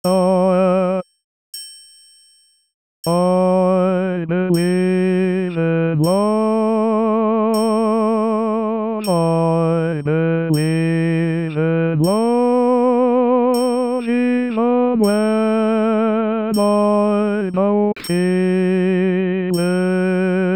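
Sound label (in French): basse voix seul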